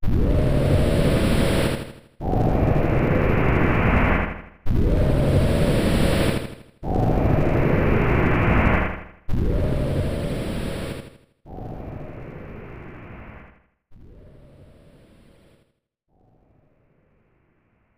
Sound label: Breath of Lavos; Chrono Trigger